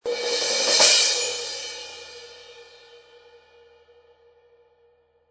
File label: fast crash; 16"; AAX X-Plosion; Cymbal; crash cymbals; Sabian